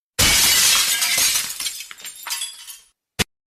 gunshots a radio

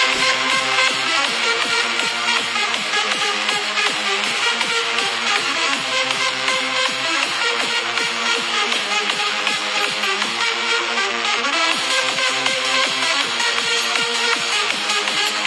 hardcore trance

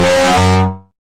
fog horn

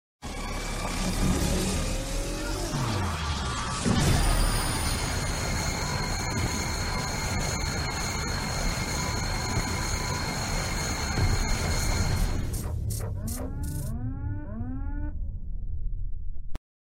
mechagodzilla proton scream; kaiju universe

